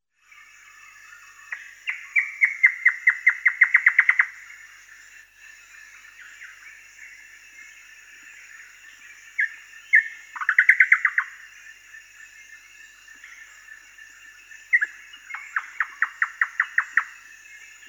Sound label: western nicator